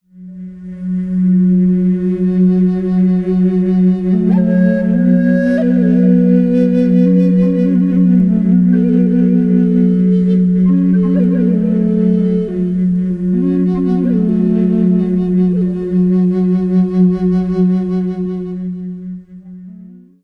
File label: Flute; PassionFlute